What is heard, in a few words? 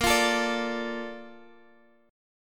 Bb Major 7th, Guitar, Open D; 20,20,19,20,x,x